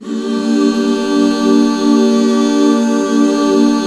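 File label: HAL G minor left, Back Chords; Sound & Vision - Gigapack II CD 1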